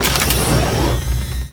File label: Gun Weapon Pickup; SFX Kit; Pickups